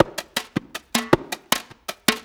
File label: Blues Perc Loop; Sonic Foundry (Sony Creative Software); Loops & Samples Drum Tools